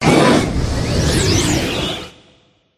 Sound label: werewolf death